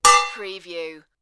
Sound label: Sword Clank